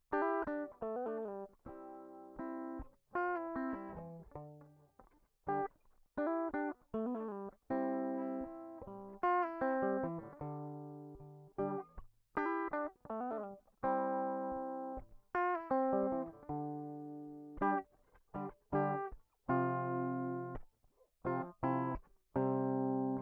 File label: Guitar